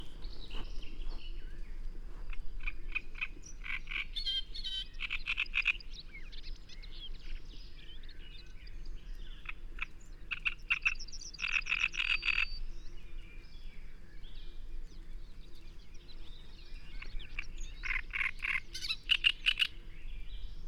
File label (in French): rousserole turdoide